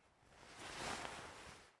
Cry Fall; raw game audio files; Mila; MiSide

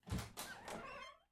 Door Open; game audio; Mita; MiSide

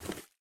Ramen Take; MiSide

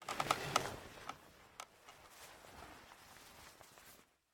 Window Push; MiSide

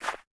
Snow Ball Floor; MiSide

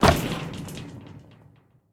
Player Fall Iron; Game rip; MiSide

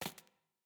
Step Wood Noise; Game rip; MiSide

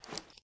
Glasses Take; MiSide

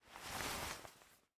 Broke Interface; raw game audio files; Mita; MiSide

